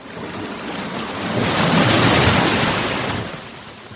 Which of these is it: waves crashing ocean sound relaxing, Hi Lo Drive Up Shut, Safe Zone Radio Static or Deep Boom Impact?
waves crashing ocean sound relaxing